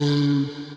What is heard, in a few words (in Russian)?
udar